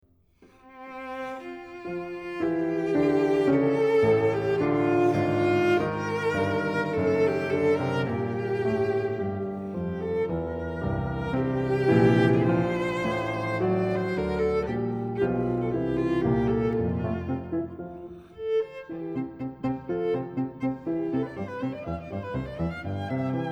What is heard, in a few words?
12 Variations for Cello and Piano